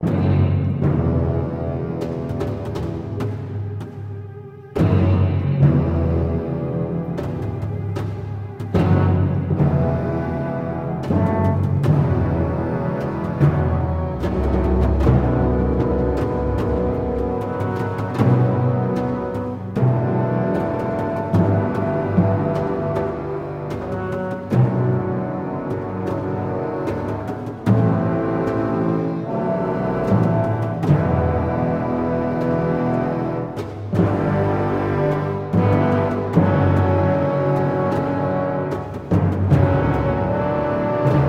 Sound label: Combat Loop